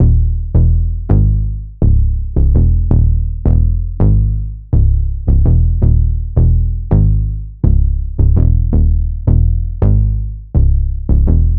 165 BPM Dbm

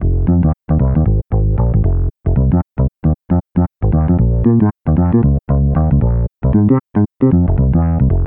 Funk

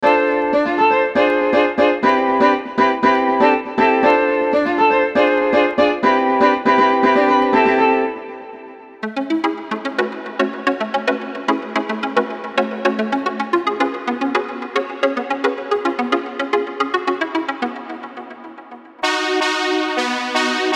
Major Sixth Diminished Jazz Major Melodies

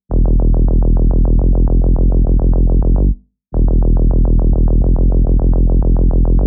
Trance Psytrance

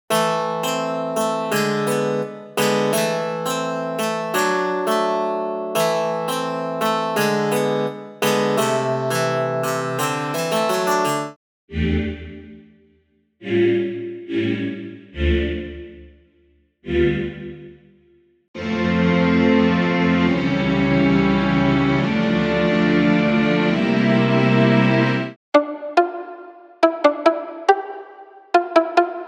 Classical; unisynth

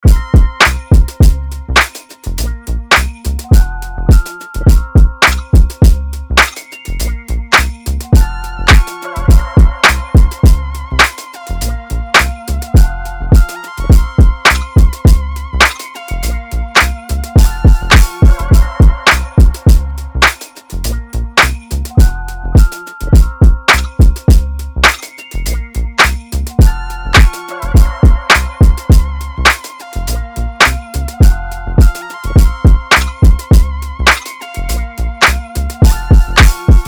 104 BPM Bbm; UNISON DEMO; Daytons

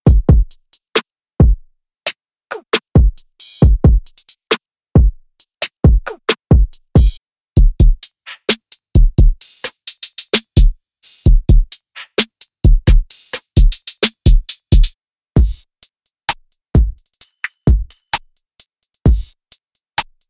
Drum RB; Drum Monkey; Unison MIDI Wizard Plug-In; Drum Demo

